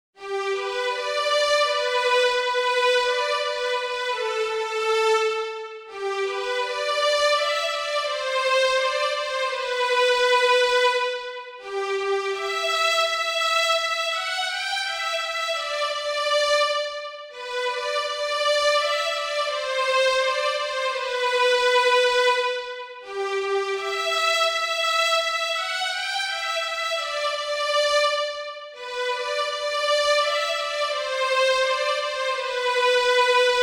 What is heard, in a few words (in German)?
G-Dur 84bpm, Volkslieder; Wahre Freundschaft